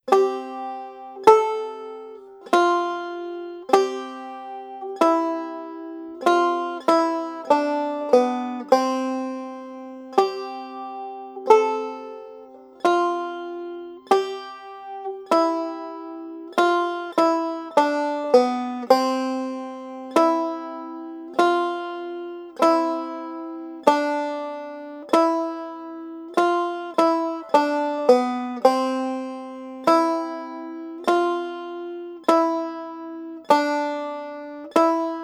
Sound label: Melody; Old Molly Hare